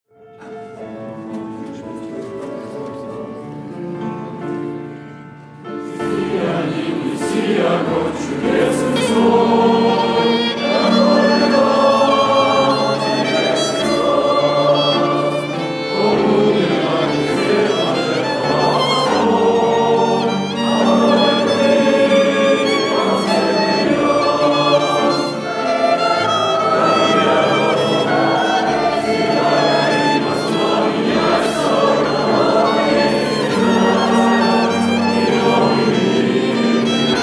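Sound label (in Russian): Общее пение; Xristianin nesi ogon; Молодежное Сакраменто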